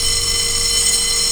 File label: alarm; Soldier Of Fortune II Double Helix; XBOX; ambience